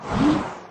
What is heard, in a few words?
accelerating; BALLY